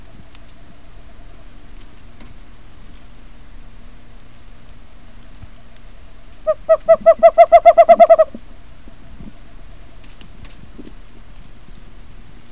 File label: western screech owl; bird songs of WA; calls